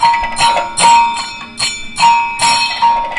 KATAK, Indian Traditions

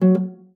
STOP F3, HARP; Xsample VOL-8; HARP+FX

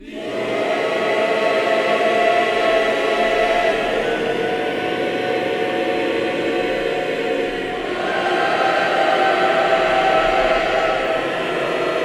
AVANTE G, Voices

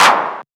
Old Skool House Clap

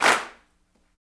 CLAPS, Africa Handclaps

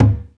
perc organic skin

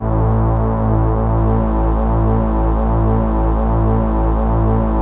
SYN, ENSEMBLE; Best Service ProSamples vol.55 - Retro Sampler; AKAI

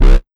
ROCK 132 FX, Big Beat Drumloops

